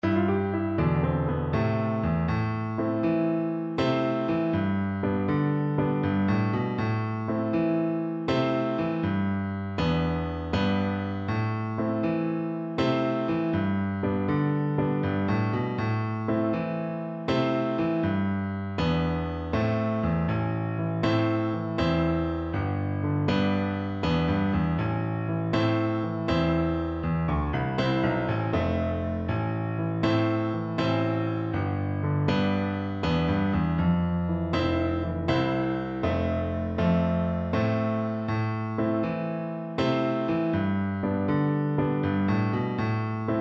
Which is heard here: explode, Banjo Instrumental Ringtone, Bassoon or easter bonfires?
Bassoon